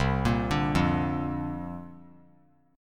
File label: C 9th Suspended 4th C down 3C, 3F, 3A, 3D; C9sus4 arpeggiated; JGuitar